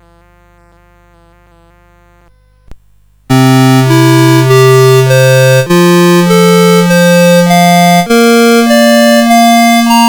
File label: Arpeggios, CSG8580R5